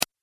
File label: Pc Mouse Single Click